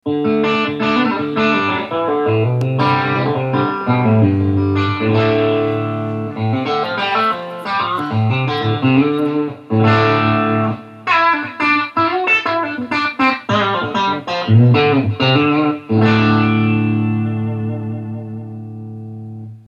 British 60

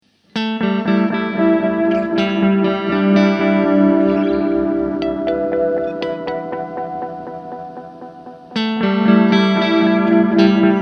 DELAY CHORUS

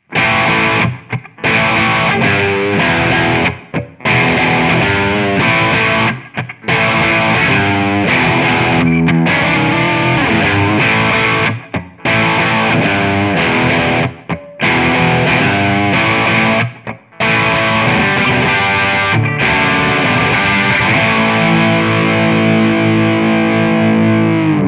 Brit Plexi; Harley Benton DNAfx GiT Pro

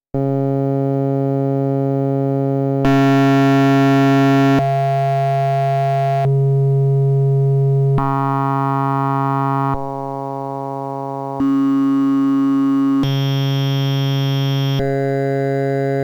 Studio Electronics Quadnic Waveforms Bank

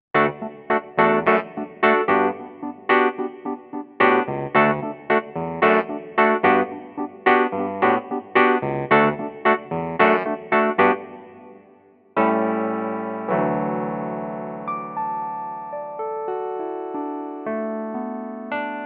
Roland VR 730 E Piano 60s Piano

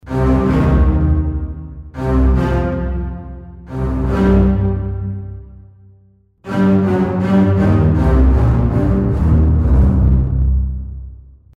String Ensemble Bass Marcato

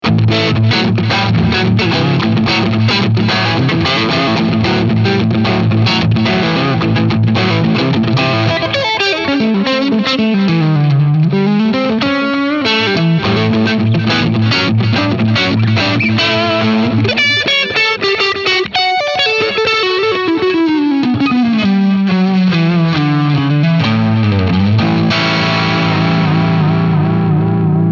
Brit 800 Wide Delay

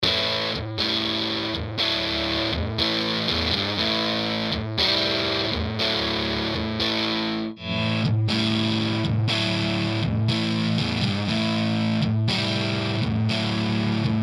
gr plexi

